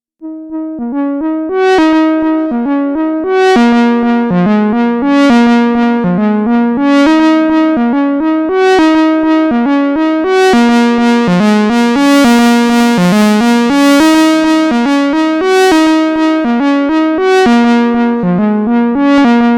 Axxe Pulse PWM

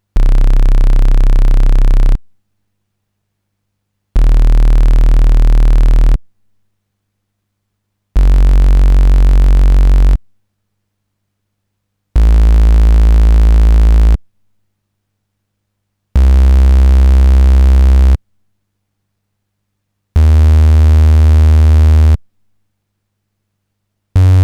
Tri Saw, synthesizer

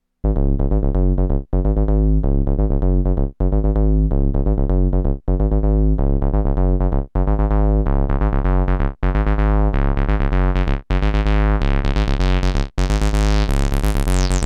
Volca Bass

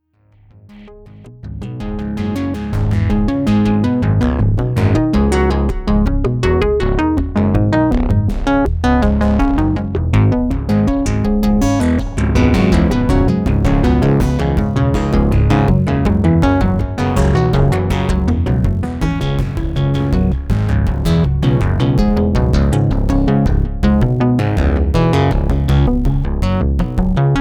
Sequential Pro3

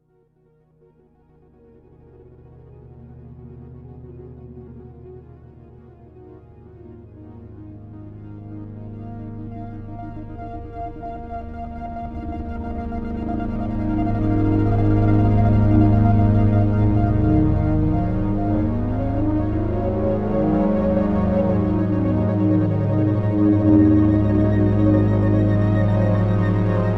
Solaris LFO mod EG

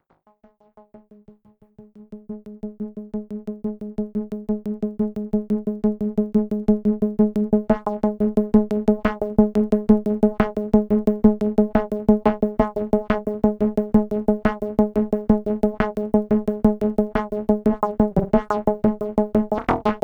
psychodelic

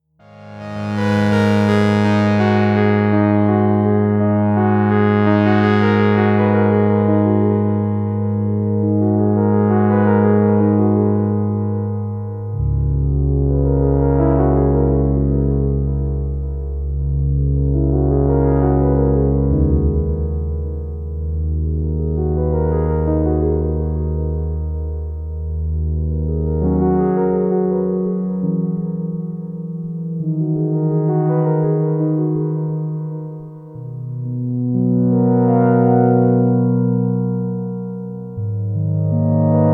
Crumar Performer Original Legato

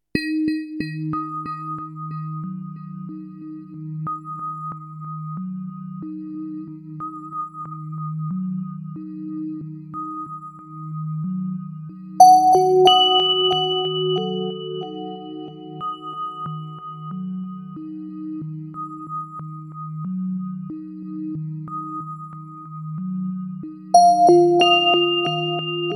Solaris Step Melody

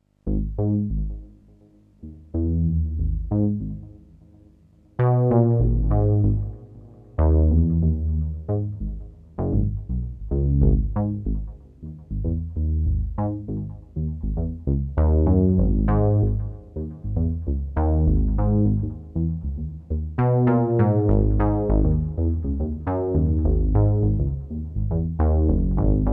Crumar Bit01 Bass

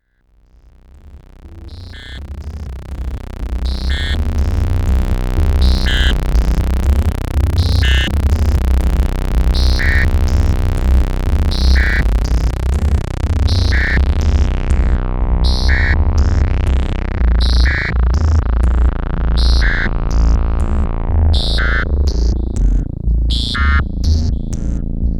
MicroBrute Bass

